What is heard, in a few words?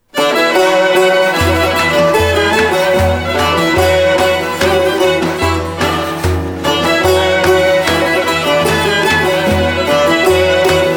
Instrumental